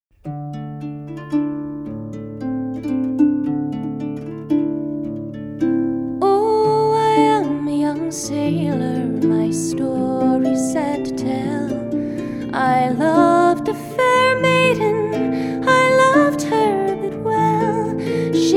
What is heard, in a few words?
singing and playing the traditional Celtic song, Harp; The Blackbird